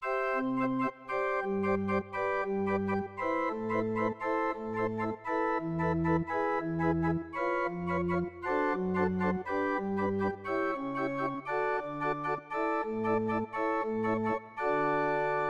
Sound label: Toccata